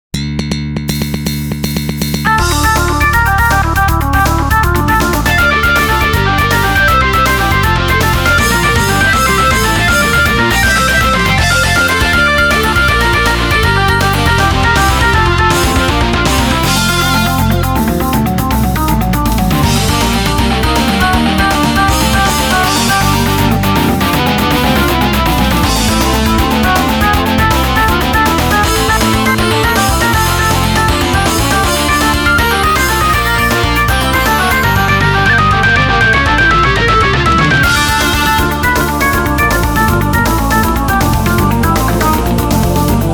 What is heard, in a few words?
prelude D minor